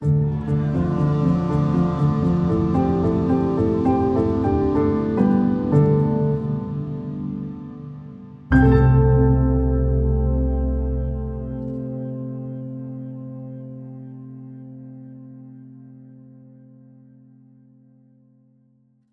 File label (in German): Soundlogo